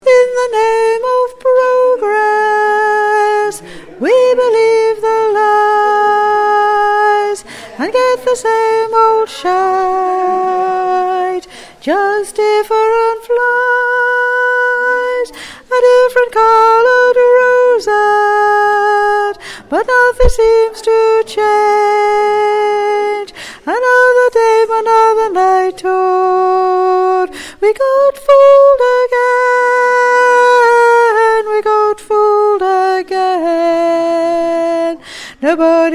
tenor

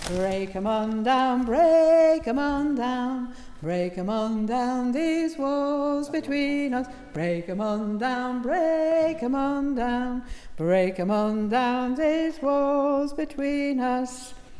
Tune; Break Em On Down

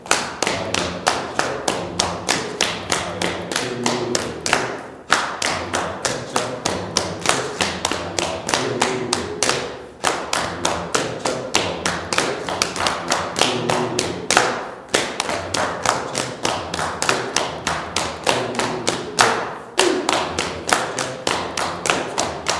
funk Break Rhythm, samba

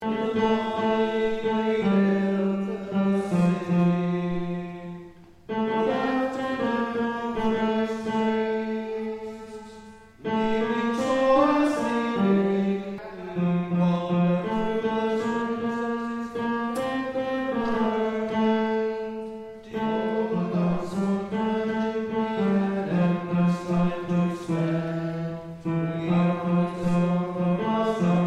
Tenor

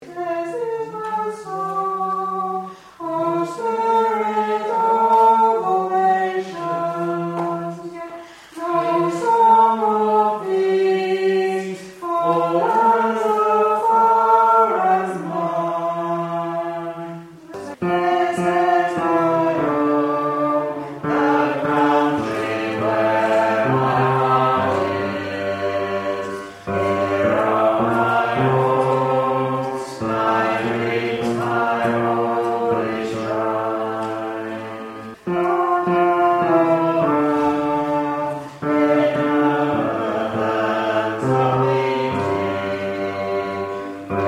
Bass

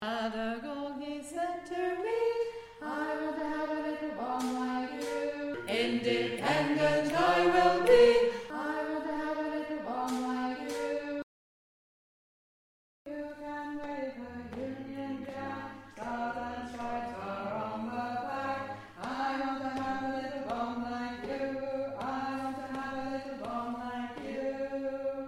alto

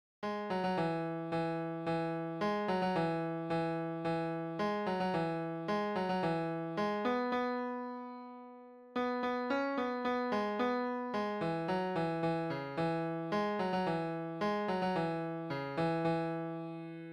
midi

